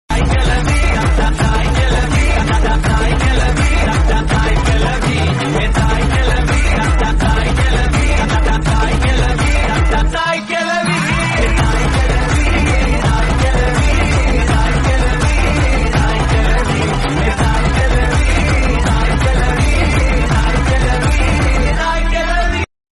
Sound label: song; thiruchitrabalm